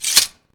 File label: sword; buckler